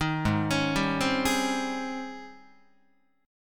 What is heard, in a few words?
Ab Major 11th Ab Guitar; AbM11 arpeggiated; 6,4,4,5,4,7